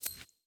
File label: sym whoosh to reel